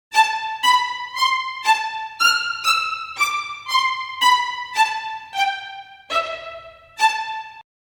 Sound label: Violins Spicatto